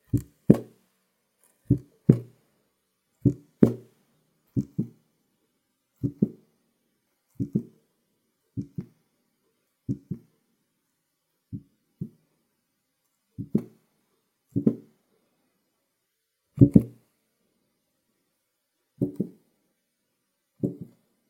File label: Wobble